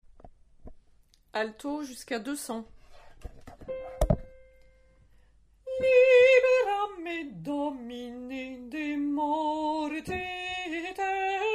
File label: Alto